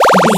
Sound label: retro powerup item